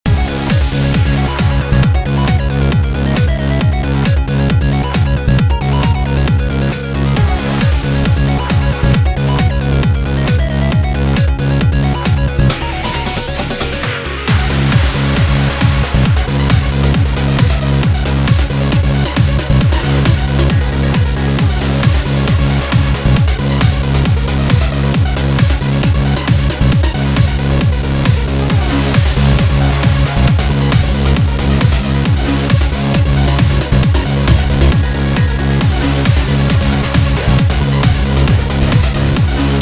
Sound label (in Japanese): 火山ステージBGM loop; Apploval